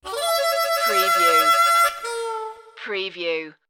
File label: sad harmonica sting